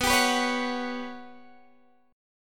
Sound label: G Major Sharp 11th B, chord